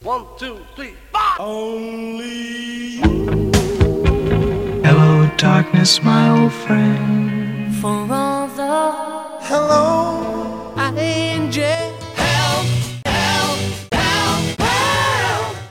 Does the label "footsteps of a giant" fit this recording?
No